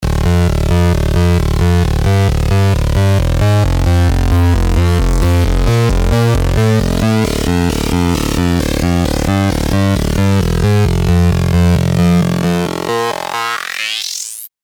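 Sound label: Bass Flanged; SERUM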